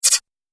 Double Fast Whoosh; Whoosh Sound Effects

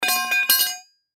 Crowbar Set Down On Cement; Hand Tool; Industry; Tools

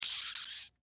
Food Tomato Squeeze Liquid Squirt Vegetable; Household; Blastwave; Foley

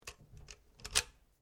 RIFLE ANSCHUTZ 67MSR 22 CALIBRE MAGAZINE RELEASE; A selection of domesttic firearms; Rifles

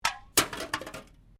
Can Softdrink Rubbish; various metal cans; Metal (Sound Effects:Materials)

